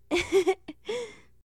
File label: Kiss giggle; Sex Lines; Hilzartov; Fairy Size Doesn't Matter